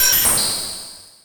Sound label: Magic